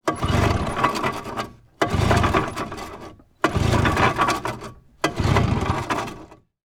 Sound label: boat outboard motor pull cord; transportation